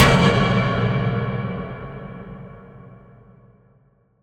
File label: heavy metal impact tank; Bluezone Corporation